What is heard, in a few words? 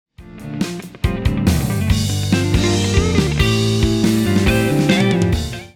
Fill